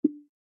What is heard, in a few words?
Next Page